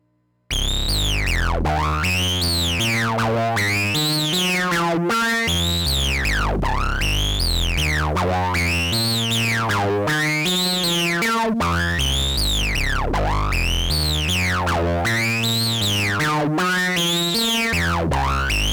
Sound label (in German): Synth Sequenz; Audient EVO 16; 146LUFS